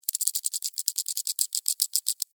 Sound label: Insect Walk Move, Animal, Insect, Walk, Movement, Bug